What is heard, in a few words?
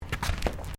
FOOTSTEPS THONGS (FLIPFLOPS) TILES STEP